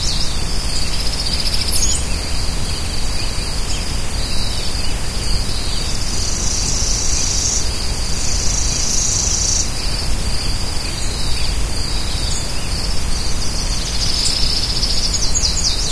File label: tree street bird loop; ambient